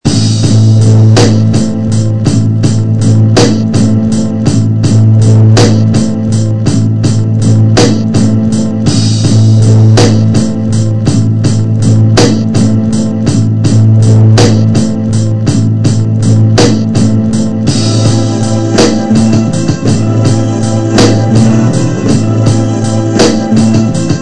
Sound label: Trumpets